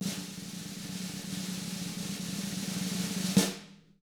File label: SNARE ROLL